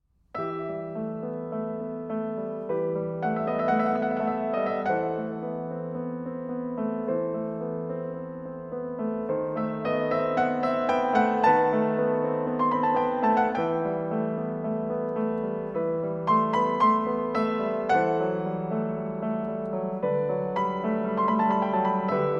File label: Prelude & Fugue in E minor